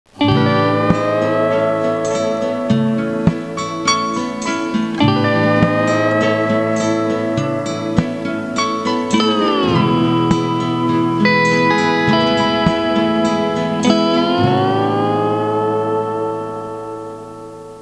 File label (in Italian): suonerie musica hawaiian